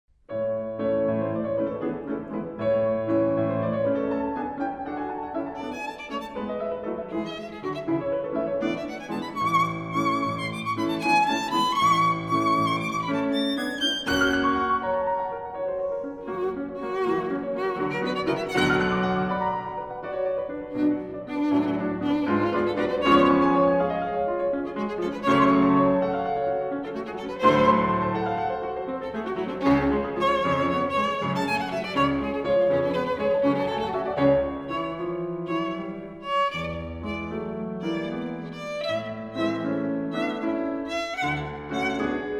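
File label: violin sonata; franck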